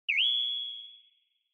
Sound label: Onoma Flash Low Short